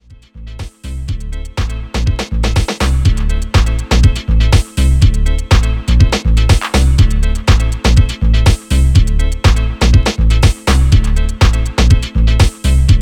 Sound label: piano